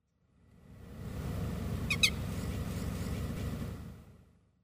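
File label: Eurasian Sparrowhawk, Sakata; koe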